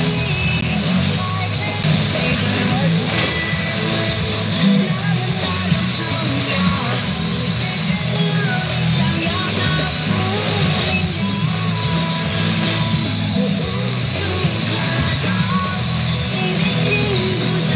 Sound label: 7mhz; rsp2